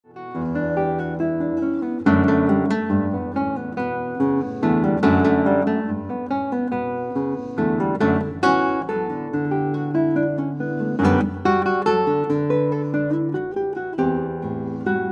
Guitar

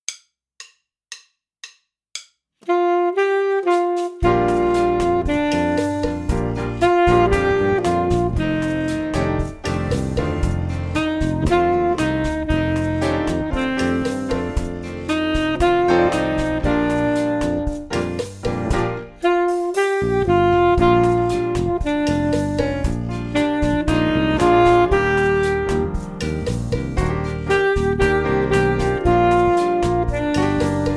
Teacher Sax

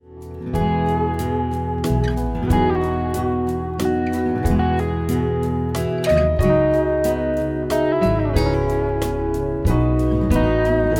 Waltz

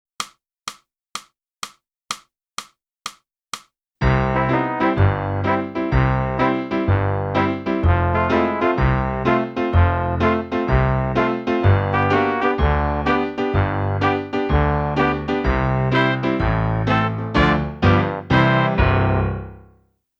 flute; boosey

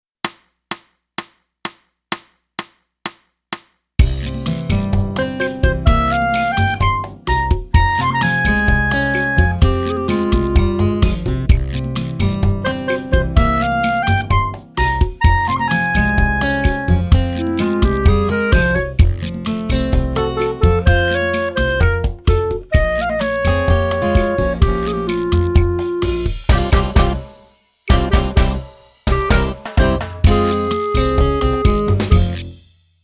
clarinet

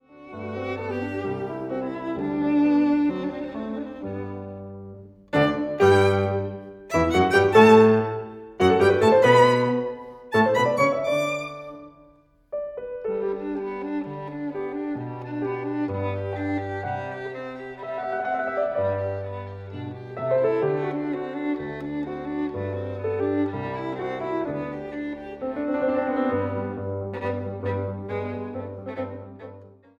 VIOLIN

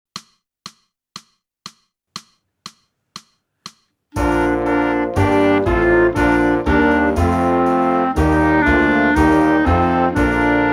clarinet; boosey method

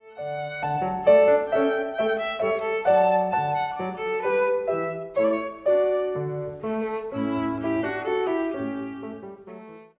VIOLIN

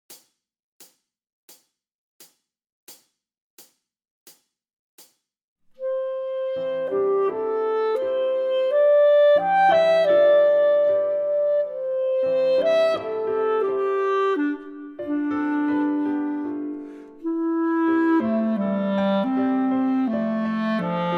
Full Clarinet

